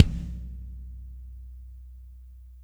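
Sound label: compressed kick, BG KICKS; Spectrasonics; Burning Grooves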